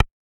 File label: keypress spacebar; effects